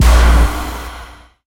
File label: Music